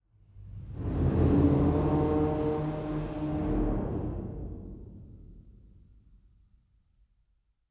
Tunnel Metal Open; Tunnel Nuendo Metal Open; inside

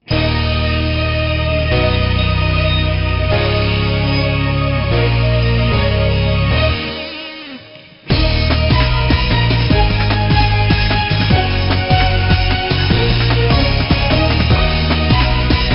Rhythm Hard

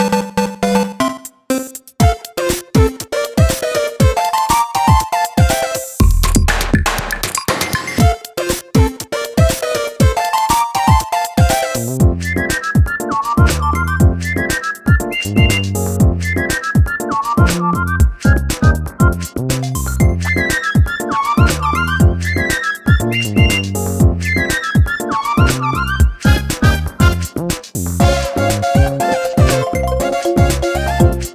Casino Block; Fortune Street; wii

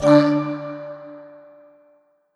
Coral Organ Note; Mario + Rabbids Kingdom Battle

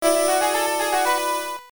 Success; Nobunaga no Yabou Zenkoku Ban; PCE Super CD-ROM2

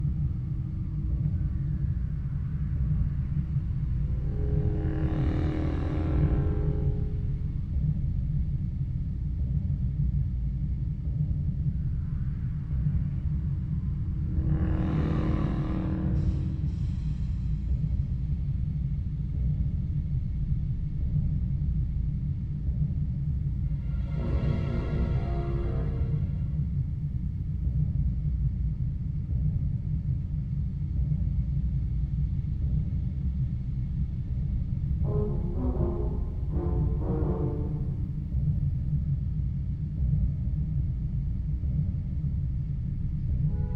Background Theme; Angry Birds Star Wars; android game music

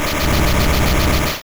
Supersonic

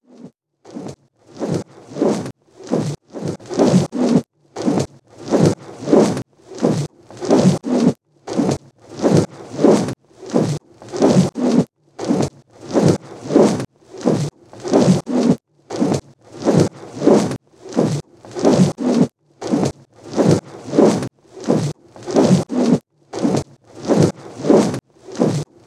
Machine Ropes Start

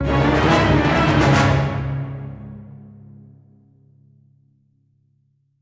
Revive Music; Zombie

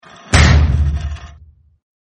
Transition

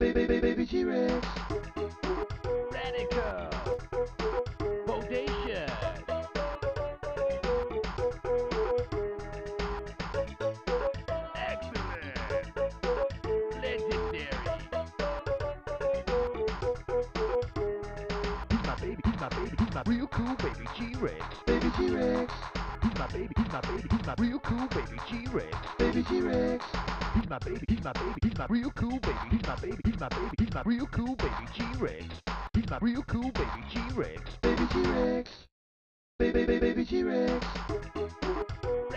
Rap; Baby T-Rex; snes

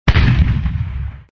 Cannon Shot; Super Mario 63

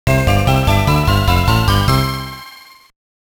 Beat Stage Boss; SpongeBob SquarePants Lights Camera Pants GBA

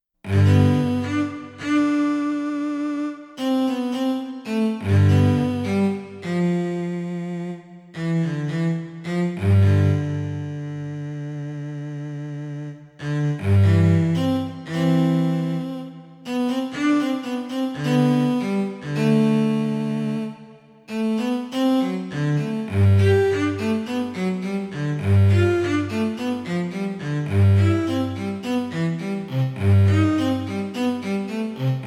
Music For Solo Violin Cello; other life azure dreams original game soundtrack

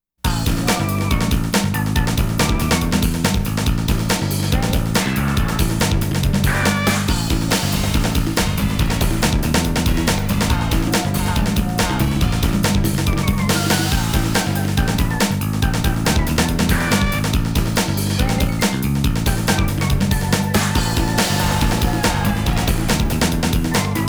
Race BGM; HYBRID NOTES

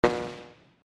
Thunderglobe Fire

